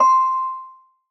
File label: soft hit whistle; osu!